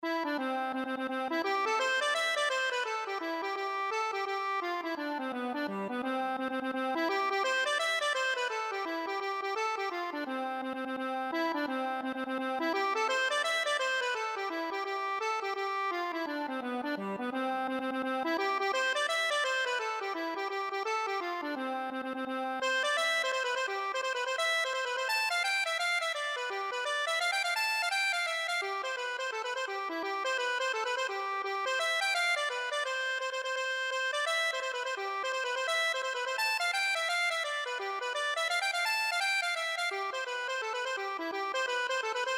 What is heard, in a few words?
dollard, accordion